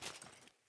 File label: Movement Armour; Characters; Enemy; Foley; Sinecure; Melee Grunt